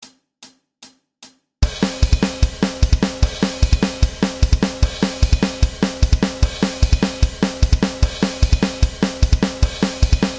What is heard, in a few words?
thrash, bass